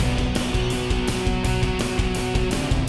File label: Boss Fight Phase 2 MIXED